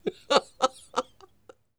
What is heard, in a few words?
LAUGH, Jazz; Spectrasonics Vocal Planet; Jazz FX